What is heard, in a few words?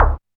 Alesis DM5 Kick